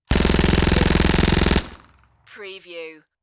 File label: Submachine Gun Uzi